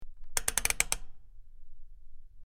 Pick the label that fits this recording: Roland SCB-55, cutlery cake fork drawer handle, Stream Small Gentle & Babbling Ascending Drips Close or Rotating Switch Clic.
Rotating Switch Clic